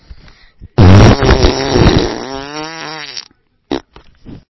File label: fart sound